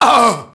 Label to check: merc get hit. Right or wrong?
Right